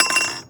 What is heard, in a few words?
Moody Park Chimes Perc; Houston